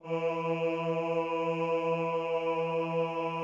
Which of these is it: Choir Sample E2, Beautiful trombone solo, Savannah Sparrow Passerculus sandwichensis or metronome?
Choir Sample E2